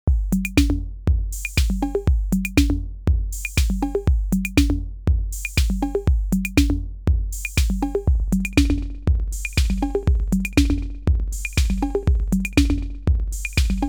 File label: Drumloop; STRYMON DIG